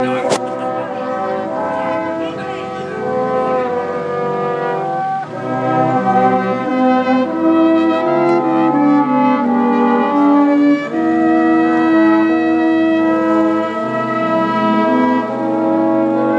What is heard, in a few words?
brass in leamington spa